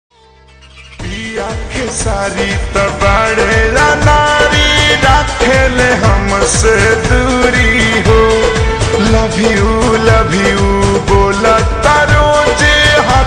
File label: Slowed Reverb Ringtone